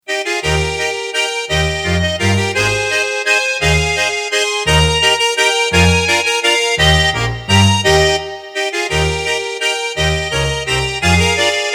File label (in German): Walzer